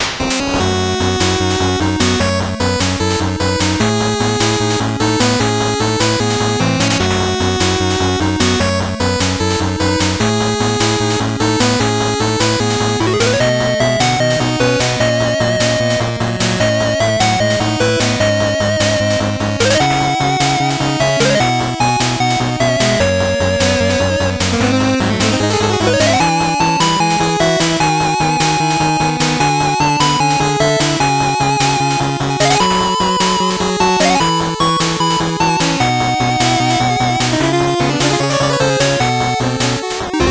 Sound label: 8bit bgm slow